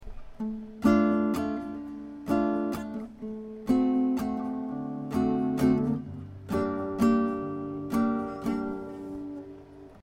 minor, guitar chords; Cowboy Guitar; Seventh to Minor

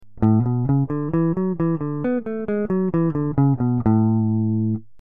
Ionian, guitar

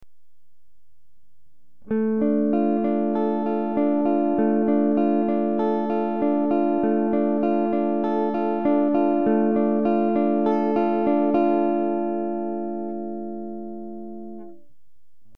Dim, guitar; Harmony Using Chords; Guitar 1 sounds like this playing the sequence on the Dm only

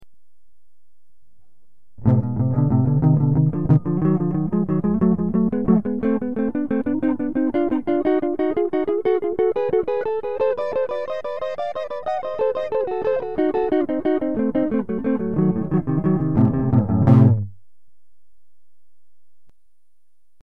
Whole Half Dim

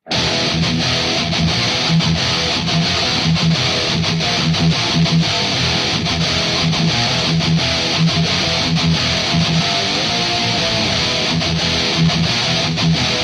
Breakdown Practice Riff, guitar

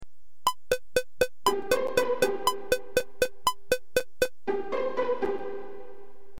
Quaver Example, guitar; 4/8

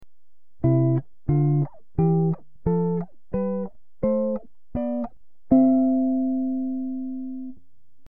Octave Harmony; Two Part Harmony/One Guitar; Major Diatonic Movements

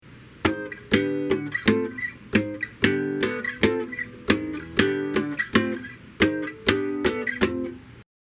Slap, guitar

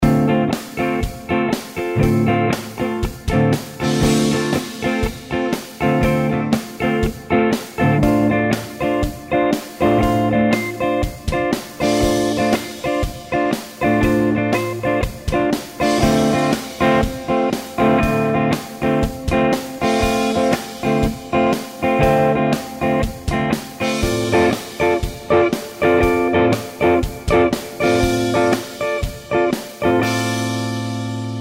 Dm7 Chords, guitar

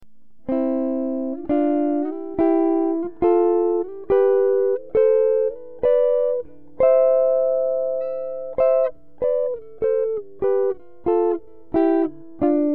GB Harmony, guitar; Two Part Harmony/One Guitar

